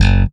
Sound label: Bass, pull F#1; Sampleheads; Will Lee Bass Library